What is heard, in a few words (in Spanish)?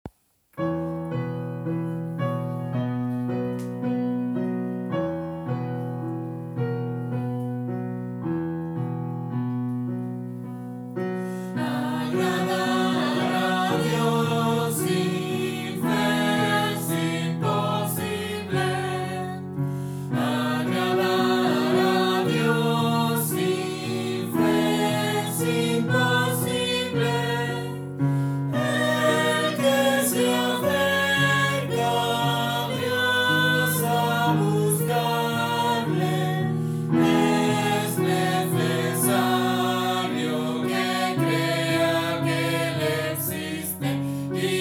piano